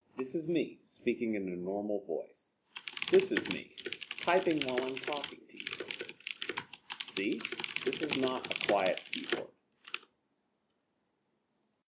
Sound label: das keyboard